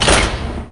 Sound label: lock